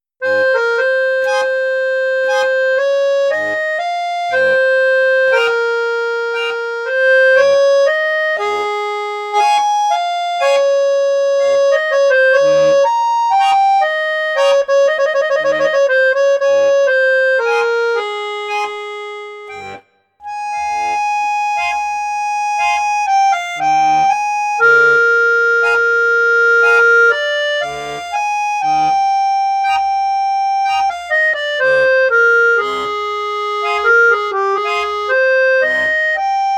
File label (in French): registre basson, accordéon; pigini star jazz